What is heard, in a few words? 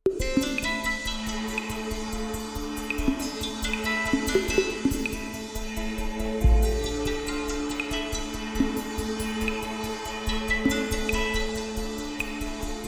Drone